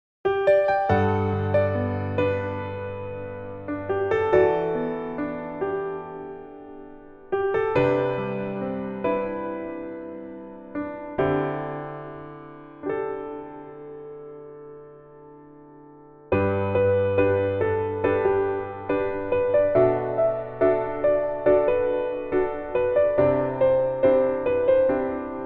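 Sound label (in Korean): Piano version 멜로디MR 여자키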